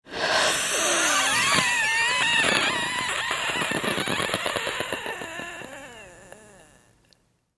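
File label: sounds of wheezing in a person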